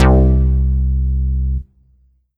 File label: Korg NS5R Synth Bass C2